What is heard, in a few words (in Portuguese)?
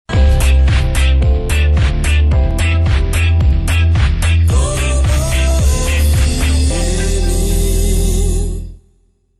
Globo FM RJ Vinheta